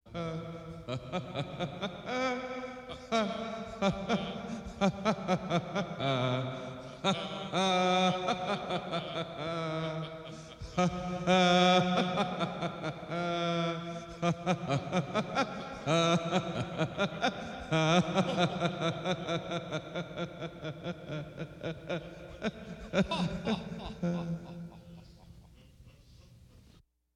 singendes gelächter; Lachen

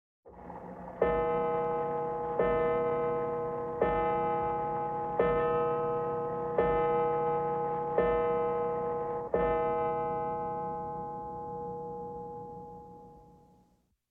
kaminuhr; Auf dem Land

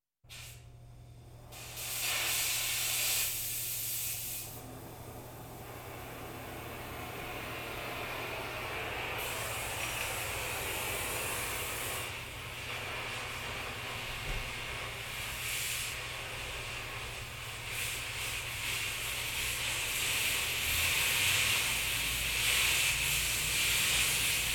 schweissen mit gas (autogen); Geraeusche

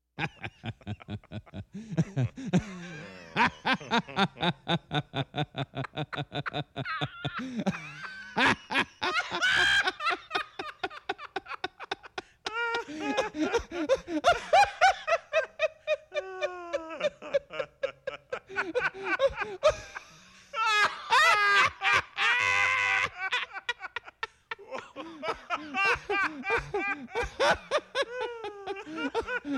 gelächter; Geraeusche; Lachen

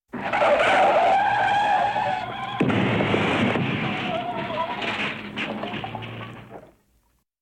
sportwagen prallt gegen baum; Katastrophen

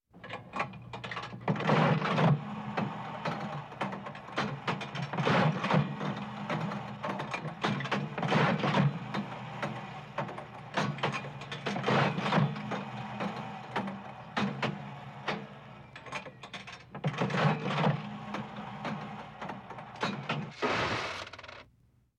münzeinwurf - glücksspielautomat; Gluecksspiel

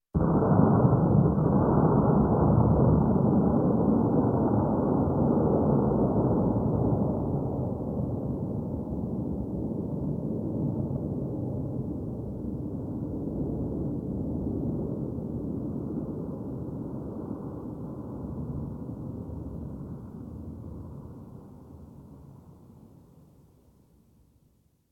atombombe; Katastrophen

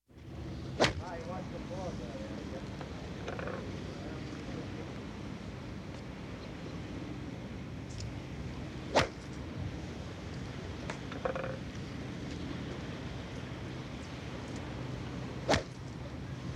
abschlagen von golfbällen; Sport

